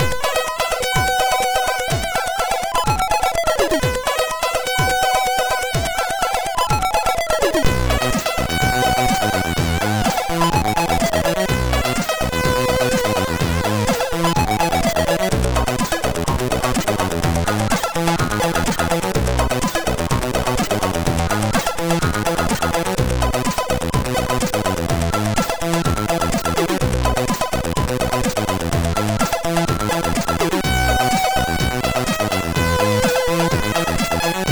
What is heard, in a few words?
Intro, MOS6581R3; Lazer Intro